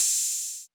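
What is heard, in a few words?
Open Hi-Hat 808 Saturated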